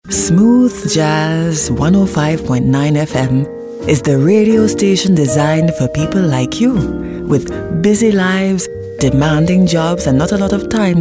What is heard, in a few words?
Smooth Jazz